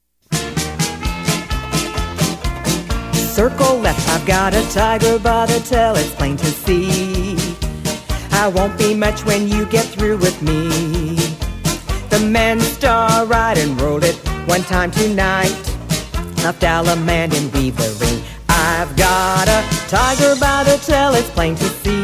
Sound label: vocal